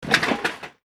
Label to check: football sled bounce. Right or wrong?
Right